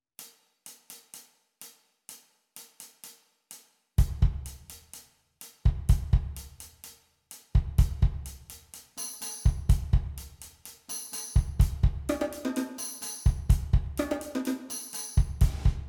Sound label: Rhythm Power